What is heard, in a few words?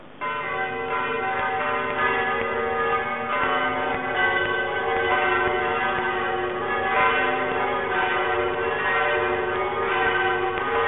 Bell